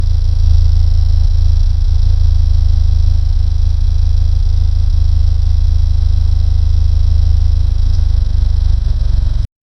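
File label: Porsche 930 low rpm exhaust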